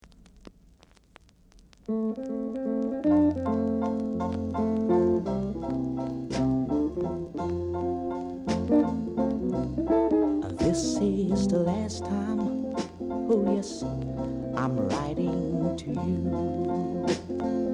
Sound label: melody